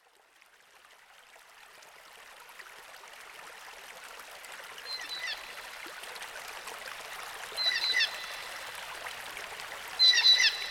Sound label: Forest Cello